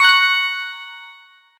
Squeezer Part Collect

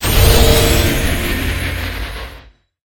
Unlocked New Crystal Core

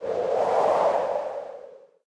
Wind; New The Denpa Men

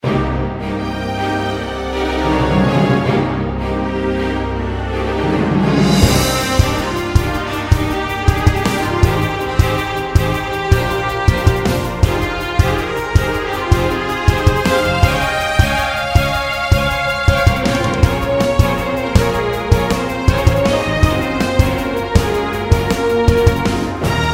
battle; Deadly Sin 2

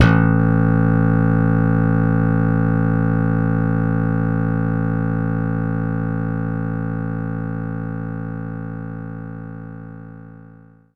Slap Bass A2F

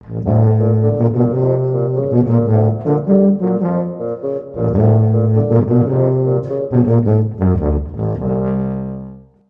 Motown Intro

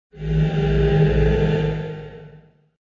heal sound; the necronomicon

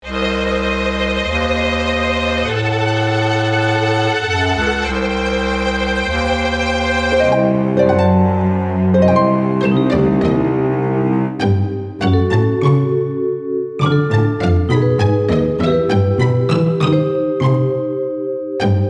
Eerie

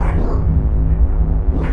Energy Wall Loop

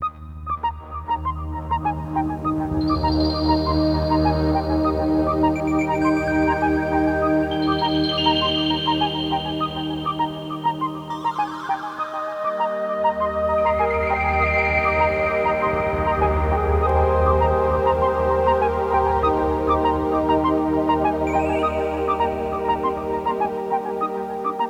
Electronic; Californium

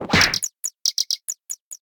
Gameplay Knockout; Zombie Catchers

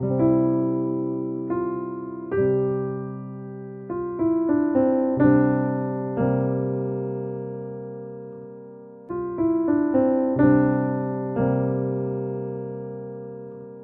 Piano Sequence; mass effect 3 citadel gamerip 2013; Silversun Strip

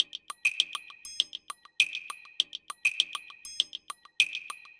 bird pickup; Toki Tori 2+; Toki Tori Collection

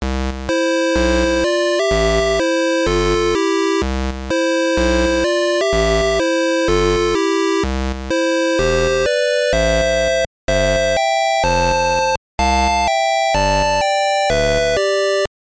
BGM; Bikkuriman 2000 Charging Card; GB; GBC

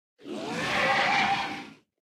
Dragon Roars; mighty party 2017 gamerip pc ios android

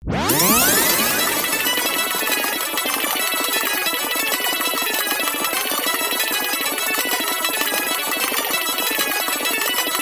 Jingle ff; Blinx 2 Masters of Time and Space; Xbox

